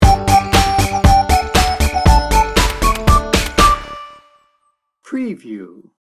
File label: jingle bells sting bumper